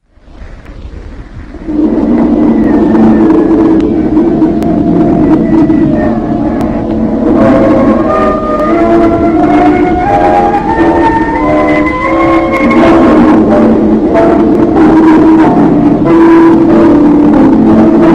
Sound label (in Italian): corale; 1921; suoni futur musica; Russolo